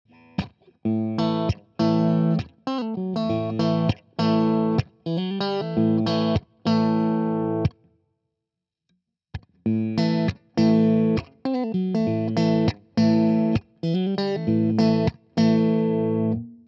Fender PP Nashville Tele Push Pull Pos. 2 Clean

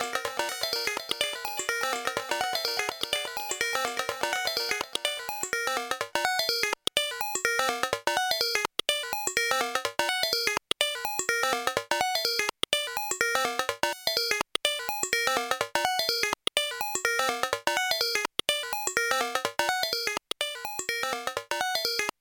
Elektron Analog Rytm Cow Bell Metallic Pattern

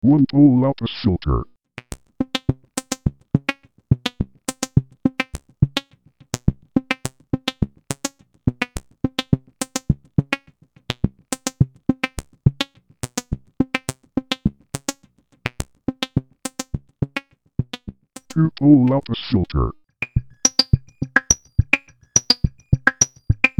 Filter Types

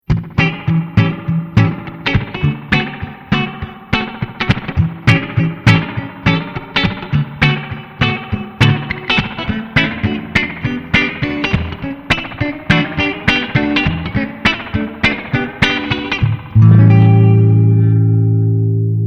soul funk

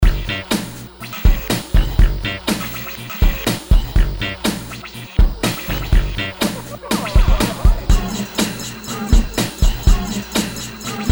construction kits

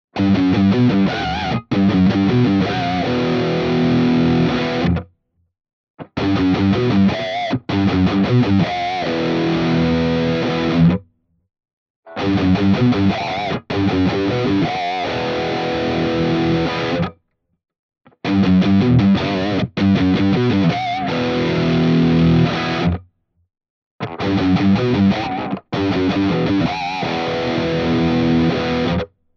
ESP LTD TE 1000 Rhythm

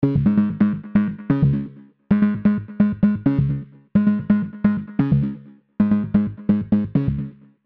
Bass Amp; Arturia KORG MS 20 V; Dist OPAMP 21

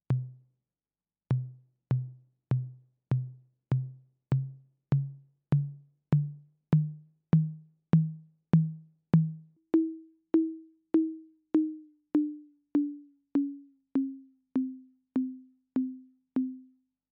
Mid Tom Conga

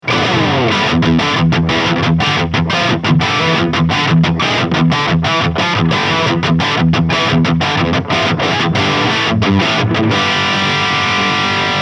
Solar Guitars A16D Lead

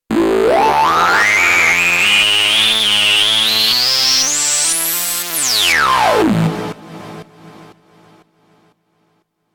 Pulse Verb; KOPRO+

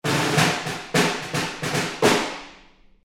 Snare Main Mic

